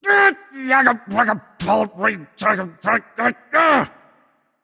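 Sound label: grumble, non-verbal; voicepack; smite; merlin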